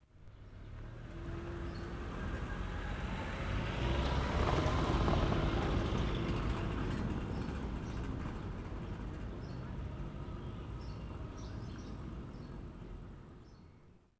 auto passing; Ambient; Civ4 warlords

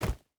footstep burlap forest jump land; Rust

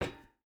footstep boots metal run; Rust

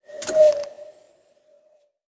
hint; World of Tanks Blitz; GUI

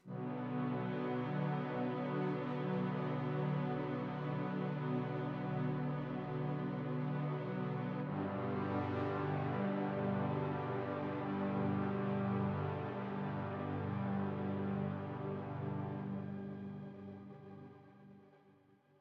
music interactive; World of Tanks

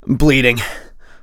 bleed in; Escape from Tarkov

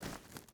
footstep hide gravel walk; Rust

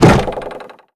door bounce; Doors; Call of Duty Modern Warfare

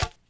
Staff Dirt; Civ4 warlords

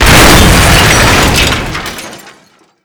explosion car close; Explosions

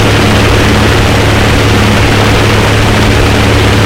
b25 rear, engines; War Thunder

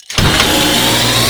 turret mgun idle start

